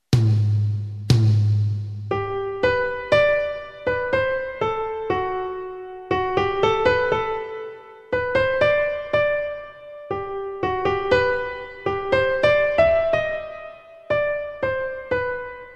dictado; conservatorio jan

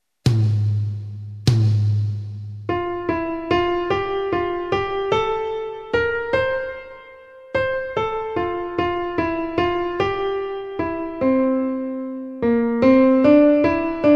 dictado; conservatorio alcaiz

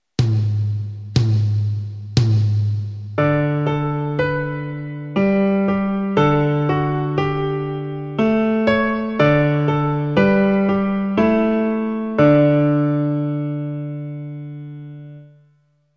2 voces

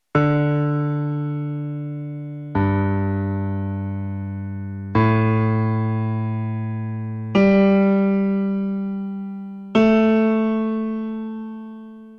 graves re m

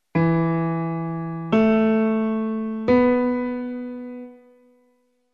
graves mi m

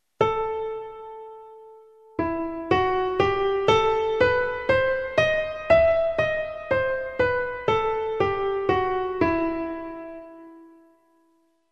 escala

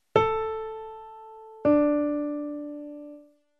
dictado primera nota

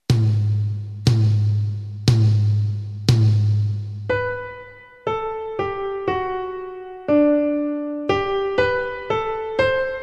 dictado orientativo; conservatorio alcal henares

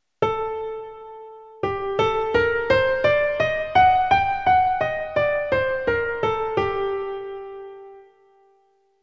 escala dictado

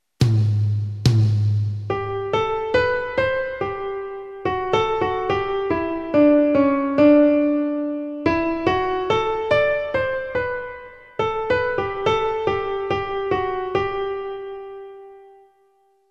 dictado; conservatorio mlaga

